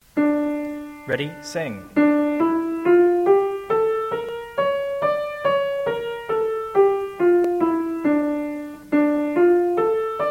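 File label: D minor, scales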